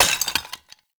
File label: Vase Clay Tiny; Objects; Vases